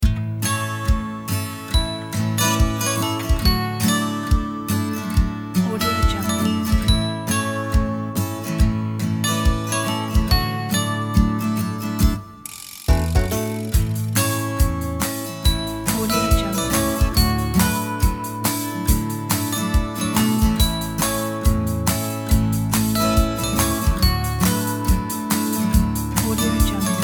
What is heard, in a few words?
happy clappy time loop